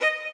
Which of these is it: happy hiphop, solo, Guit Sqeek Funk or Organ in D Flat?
solo